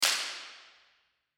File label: single clap